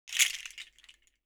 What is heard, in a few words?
shaker (kinomi)